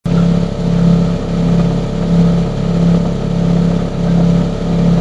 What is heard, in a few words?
buzzing humming, samsung refrigerator